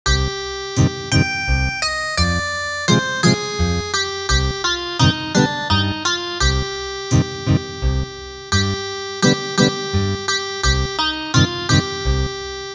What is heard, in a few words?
Ethnic Guitar, traditional